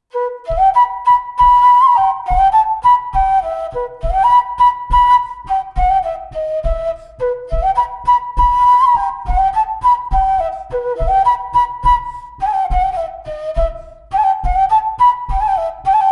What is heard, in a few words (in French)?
Montagnarde; Recueil De Raoulx